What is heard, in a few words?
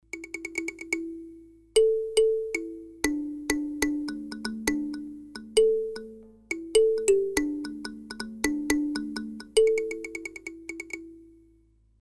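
Mooer Synth Drum Patch Kalimba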